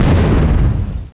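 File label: EXPLOSION SMALL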